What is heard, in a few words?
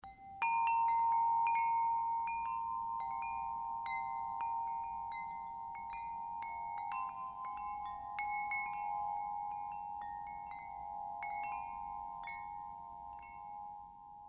medallion chime